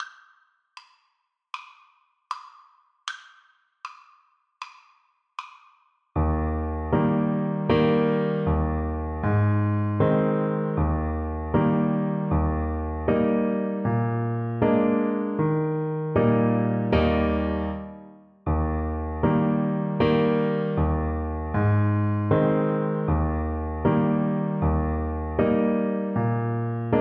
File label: old macdonald, Saxophone